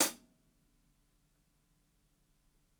ROOMY HH, HI HATS; Basement drums vol 2

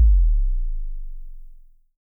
KCK, Roland SH-09 synth drums

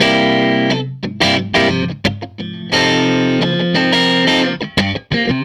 Tele Guitar Loop

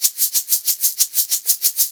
Blues Perc Loop; Drum Tools

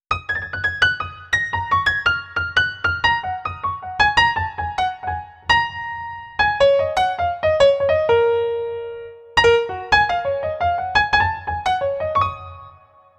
Piano Solo, Cinematic Funk; Cinematic Funk Urban Tone Poems

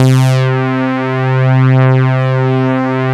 Saw Sweep C2; Proteus 2000; Digital Sound Factory Proteus 2000

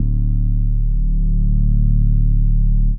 Reese D; Instrument Oneshots; 99Sounds; Monosounds

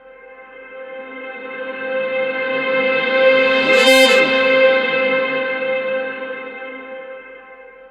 Reverse Swell Hit, sax; Effected; Sonic Foundry (Sony Creative Software); Jazz Solos and Sections

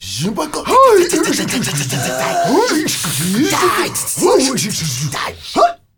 MALE NOISES, World Voices, Afro noise; ILIO; Vocal Planet VOL-5

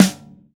WD Snare, Roland BK-5; Snares

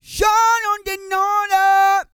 SING, World Voices, CARIBBEAN; Spectrasonics Vocal Planet CD5

